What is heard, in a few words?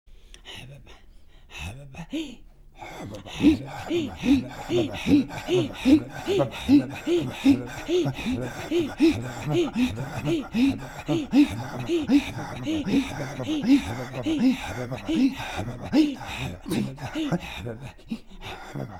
Throat Singing, INUIT; Throat Singing (II) Havava'hi